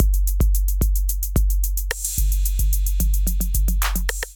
808 Kit groove 110 bpm, Drum; MIDI Clips; Aristocrat; Ableton; Drum Essentials

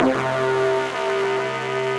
Plucked To Death; Effects; Ableton; Factory Packs; Punch and Tilt

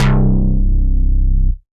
Deep and Dirty Bass; Ableton; Punch and Tilt

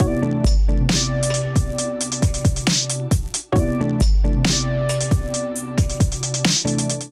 Kit groove 135 bpm, Drums; MIDI Clips; Allee Gate; Ableton; Chop and Swing

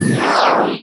Liquid Sky Diving, IR Samples Special Effects; Kontakt 5; Convolution; ModulatorsMedium

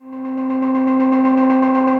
Seidig Pad, Ethereal Pads; Ableton; Mood Reel

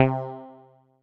Genuine Italian Synth Bass, Waveframe Bass; Ableton; Factory Packs; Retro Synths

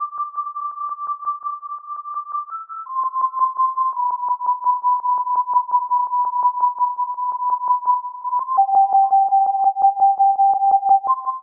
Glass Chimes C Major 84 bpm; Melodic; Tonal; MIDI Clips; Drive and Glow; Ableton; Factory Packs

